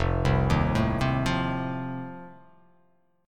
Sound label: F 7th F down; F7 arpeggiated